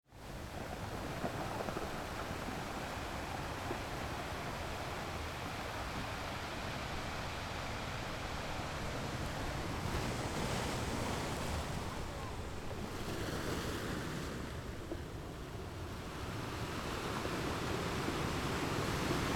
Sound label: sound ocean water against rocks